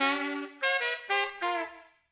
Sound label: OLDSCHOOL BRASS 96